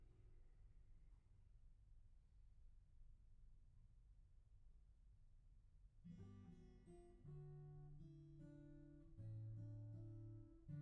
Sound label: Piano R